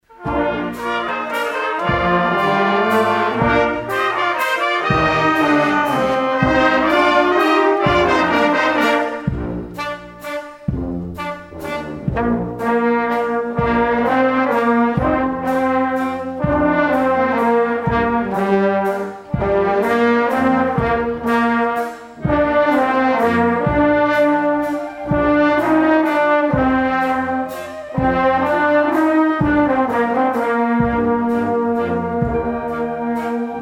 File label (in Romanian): Fanfara